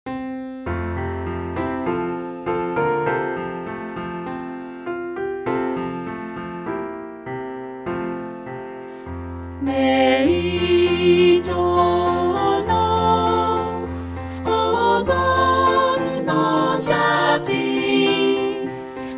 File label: college song piano version